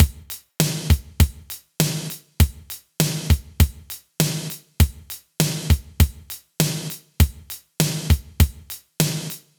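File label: 100 bpm drum groove d key, kick tuned to the D note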